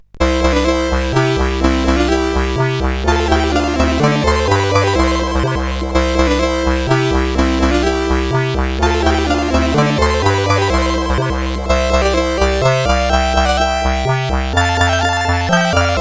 Game tune, sid CSG8580R5

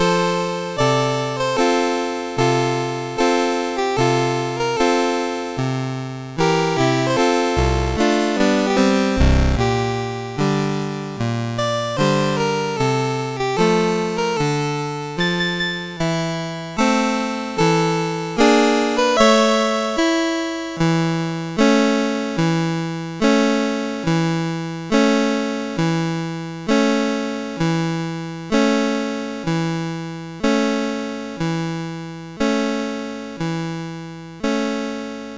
Almost the Toreador Song, CSG8580R5

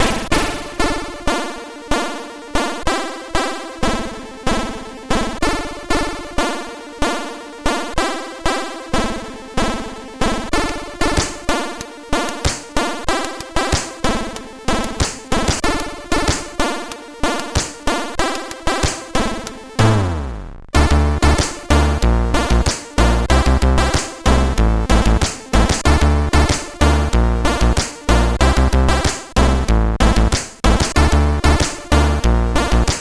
sid, CSG8580R5; Flexxible note

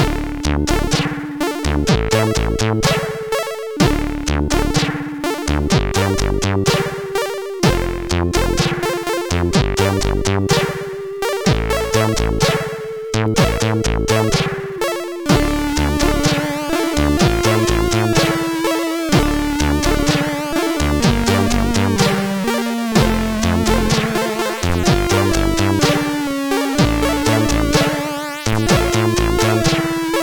sid, CSG 8580R5; hvsc

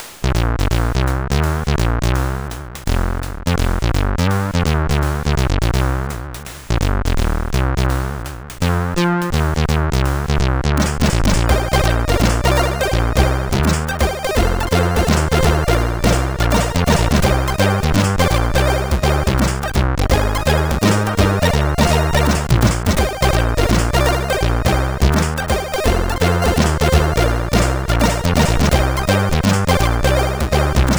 Funky Feature, CSG8580; CSG8580R5

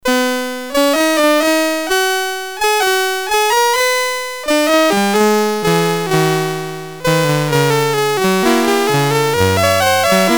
Ragtime sid CSG8580R5

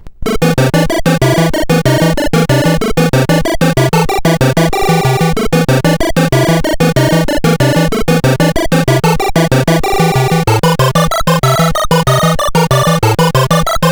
Manic Miner 64DX, CSG8580R5

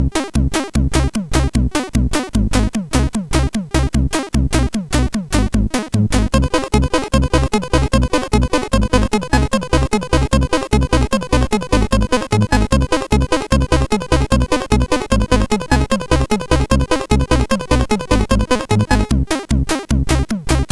Loading Music, MOS6581R4; hvsc

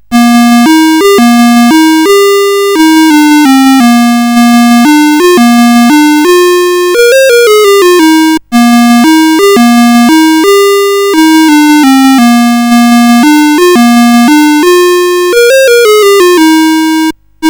La Cucaracha, CSG8580R5; Music Shop

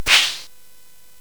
SFX; Narco Police; hvsc

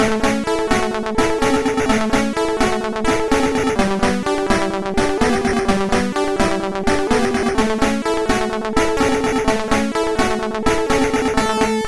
Little Song, sid MOS6581R4

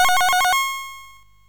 Moon Hop, sid, MOS6581R3

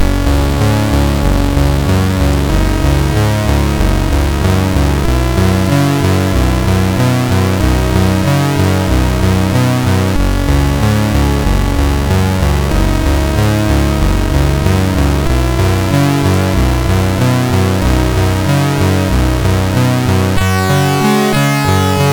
Mini Melodies, SID MOS6581R3

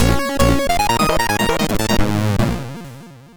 Incidental sid MOS6581R4; Street Fighter II; hvsc

